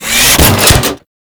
Vay Hek Drone Latch On; Warframe

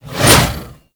Scythe Whoosh; Warframe; Weapons; Stalker Scythe

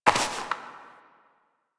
Step Dirt; Warframe; Koumei

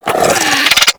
Infested Biped Alert; Warframe

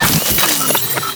Drone Spawner Open; Ambience; Gameplay; Warframe; Entrati

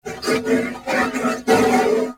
Horse Trot Breath; Warframe; Duviri Horse; Vehicles

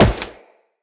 Wall Jump, Footsteps; Wall Run; Characters; Tenno

